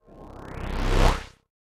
Bomb; Winkydink Boss; OK Hand

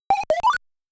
Alien - Hit; Gaplus

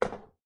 Drop Plastic Cup; lethal company

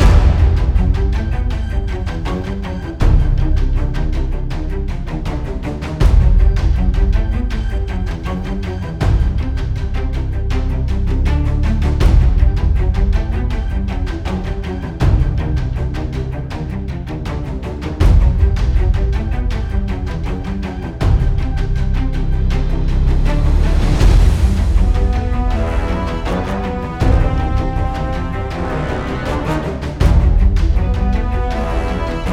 Action Loop

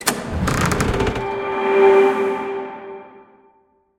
Door Open

